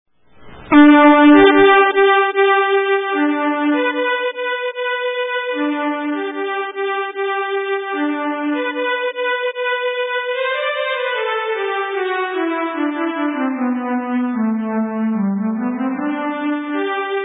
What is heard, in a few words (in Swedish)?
Skankpolska; till Brollopsbanken